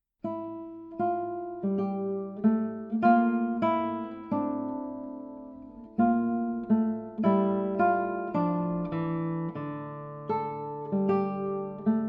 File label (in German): Anatolisches Lied, hall